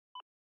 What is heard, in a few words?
radio start talking